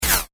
multimedia max min interface sound metal min; Multimedia Interface ' Minimise ' Sound ( Metal Style Tone ); Sound Design; McKinneySound

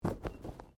Bodymovement Fall Light

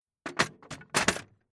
Tool Box Putting Tool Back In Box Metal Rattle

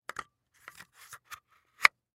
Packaging Tape; Tape Dispenser Load Tape On Wheel; Offices; Blastwave

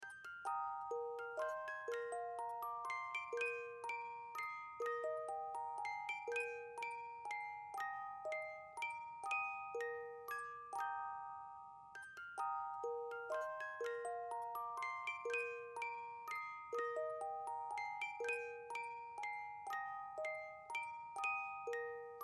Music Box, Music Box The First Noel Loop; Miscellaneous Musical; Blastwave

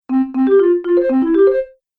Happy Cartoon Musical Conclusion

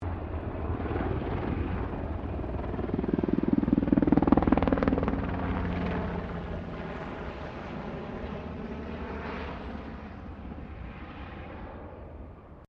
HELICOPTER 1990 SIKORSKY UH60 BLACKHAWK PASS; Helicopters; Vehicles; Aircraft Collection

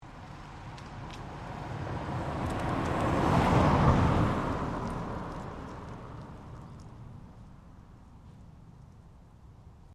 Car Ford Focus Driveby; Car Ford Focus 20 09; Ford Focus hire car; Cars (Sound Effects:Vehicles)

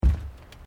Footsteps Boots Plate Metal Step; Footstep Foley; Footsteps (Sound Effects:Human)

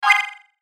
DIGITAL SHIMMER DELAY, Hit, Digital, Crackle; Production Element, Imaging Element, Accent, Transition; Multimedia; Blastwave

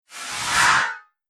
Production Whoosh; Andrew Fleming

